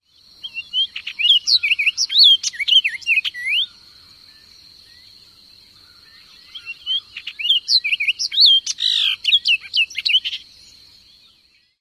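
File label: orchard oriole song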